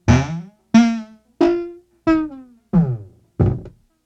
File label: Cartoons Musical; cupcakeLand